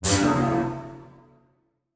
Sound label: Transition Hit Impact Short